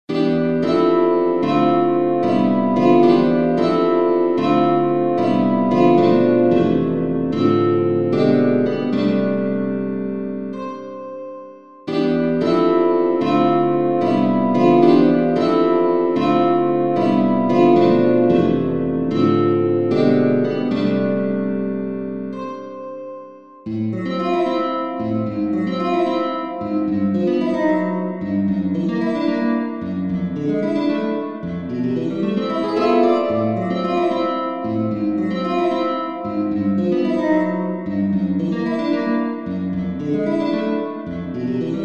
guitar studies; medium level; triandafillou akis; elitron

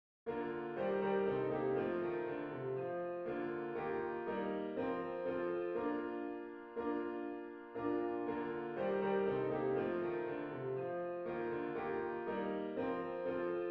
ding dong merrily high

